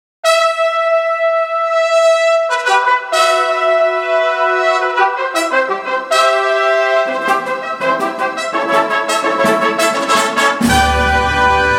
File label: trumpet